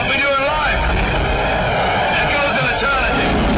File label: echoe